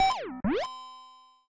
Unused Jingle; Mario Paint Prototype

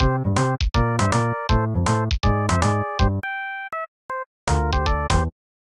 Game Over; MSP

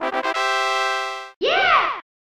Puzzle Mode Fanfare

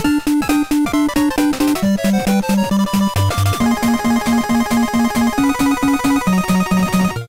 End Credits Underwater Theme; PiCTOBiTS; SMB

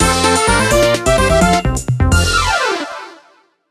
Mini-Game Win; Mario Party 3

